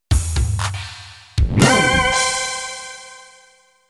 Rap Intro; Intro #3

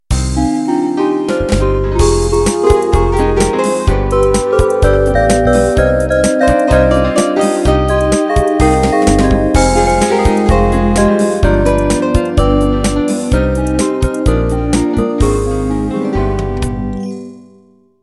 Beguine Intro

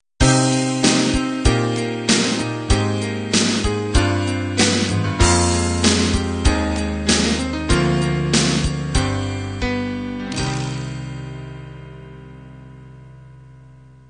rock ballad end

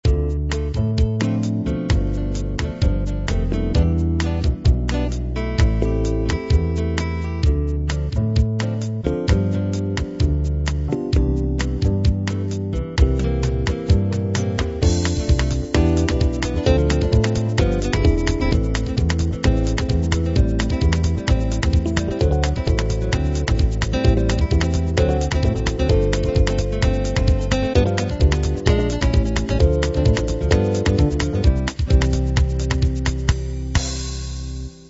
bossa samba

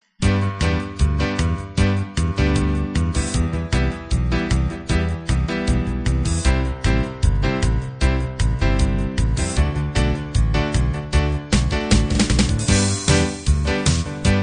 piano rock

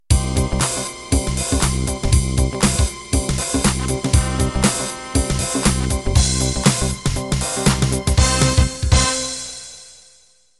HipHop End

